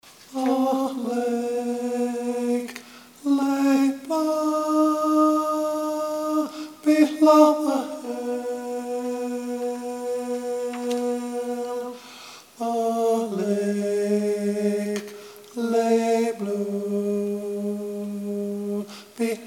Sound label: The Blue Bird Tenor; Lent/Holy Week 2013 Rehearsal recordings